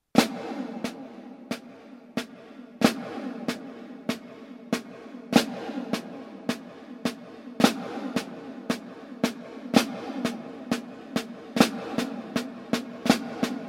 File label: flam paradidlle